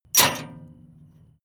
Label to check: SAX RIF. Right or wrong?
Wrong